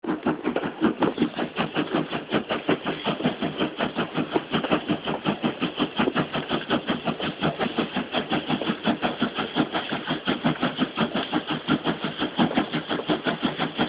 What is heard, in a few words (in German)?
Dampflok